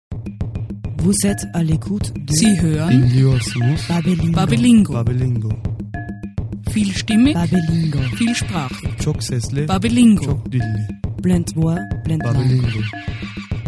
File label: jingle